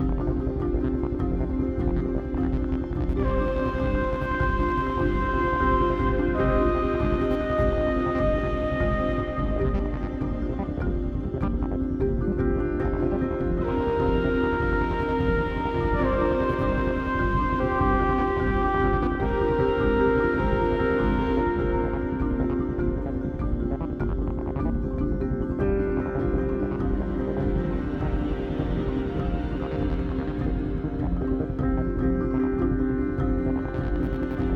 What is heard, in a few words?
Combat; SaveGranny; Wavetale; Xbox Series X/S